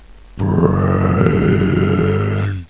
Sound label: brain, zombie; brainbread